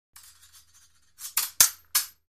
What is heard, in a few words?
Fencing Sword Tips